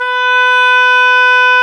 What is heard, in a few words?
oboe, Roland Orchestral Winds